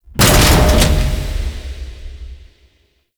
Platform Stop; Warframe; Ambience; Grineer Galleon; Vor Galleon Fight

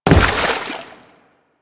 Step Water, Footsteps; Warframe; Grineer

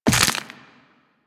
Walk Bone, Footsteps; Warframe; Operator

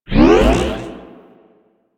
Anchors Away Loop Start; DE; Warframe; Weapons; Archwing; Powers; Archwing Healer

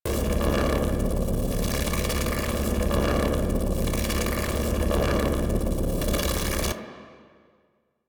Scrap Container Loop Layer; Warframe; Missions; Zariman; Zariman Assassinate

